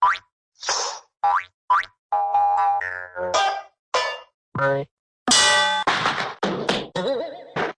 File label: vintage boinks blinks hits, Cartoon Sound Effects; Cartoon Sound Effects Kit